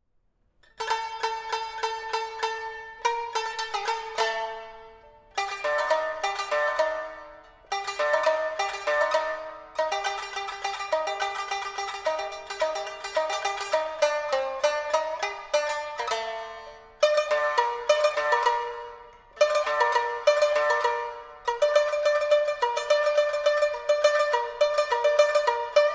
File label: shamisen; pincees